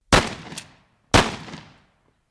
shotgun

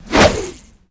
grapple reel; phantom

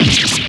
stab

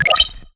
open window

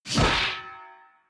pipe hit; mayaplvsaa

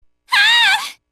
haaaaaaa; p4u; marie

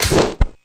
lights off

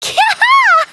kya-ha!; kotoko

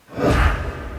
joint reasoning woosh

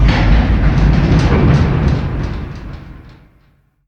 elevator stop, ambience

